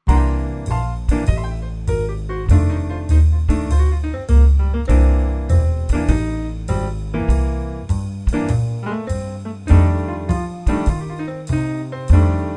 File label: Swing Jazz Walking bass